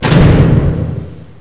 door stop; blackops

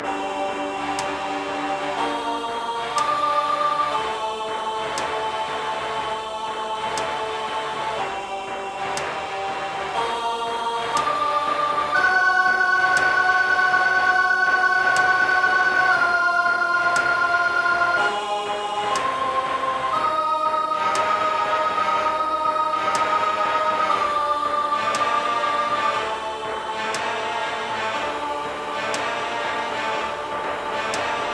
blood music; autonomy lost

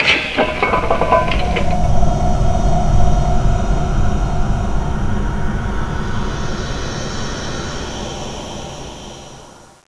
button; vendetta

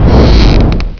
tank turn; ambience; poke646

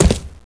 step; blackops

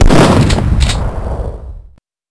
shotgun fire; duke

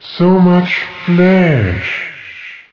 skeleton alert; monsters

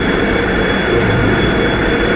buzzer machine; russian

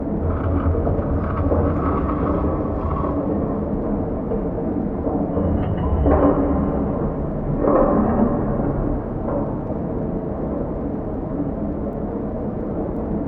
ship noise; duke

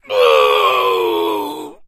death; st allies; darkwing

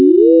active; probeboss

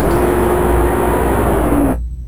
door ship; duke

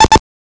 primer beep; vendetta

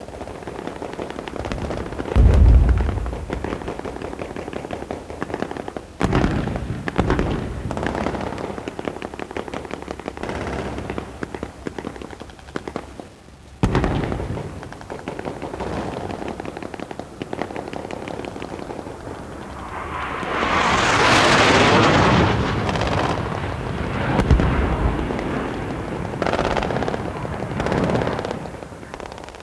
battle, ambience; vendetta

